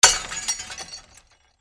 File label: BREAKING